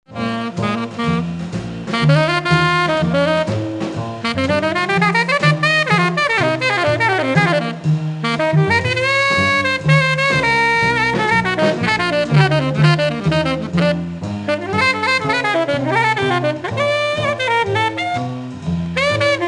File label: Saxophone; Tenor saxophone